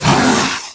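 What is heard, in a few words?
Attack; incubers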